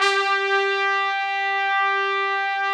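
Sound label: TRUMPET, Brass; AKAI S6000 CD-ROM - Ultimate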